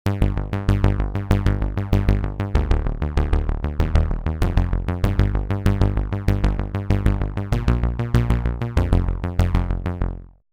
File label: bass liner, roland jv880